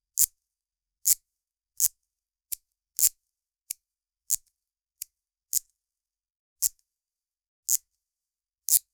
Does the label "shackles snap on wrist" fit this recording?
Yes